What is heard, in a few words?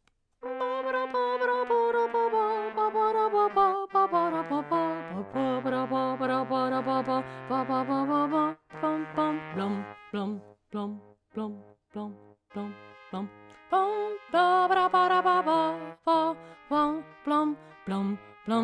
bass